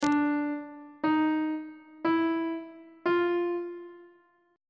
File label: d 2nd intervals on treble clef